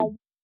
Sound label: Basso